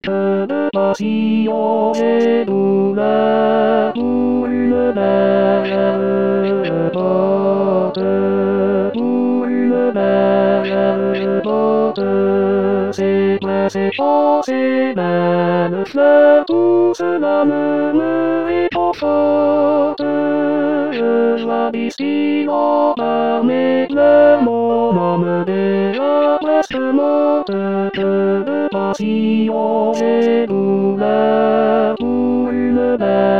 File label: tenor; rimailleurs